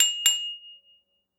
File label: ding double